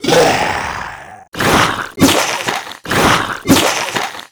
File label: Attack(mix); mars sortie arcade gamerip 2018